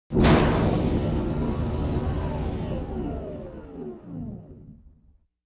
phase open, doors; CosmicRage

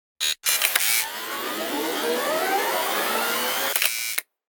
module move, ships; CosmicRage

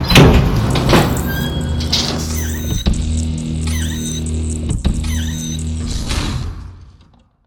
retract

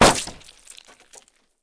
splat, highway

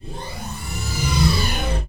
hangar; ships misc; Cosmic Rage

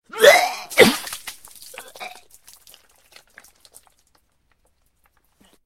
vomit; CosmicRage; socials

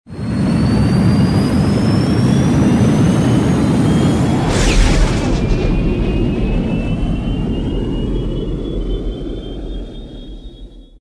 launch from docking bay, ships; Cosmic Rage; ships misc

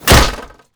metal, swipe; combat

